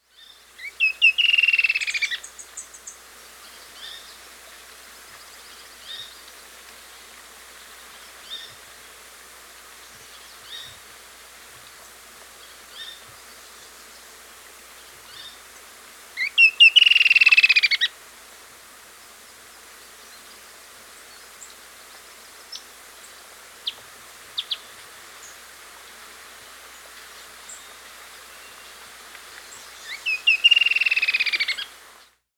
Creamy-Bellied Antwren (Herpsilochmus Motacilloides), Andean Birds; S. America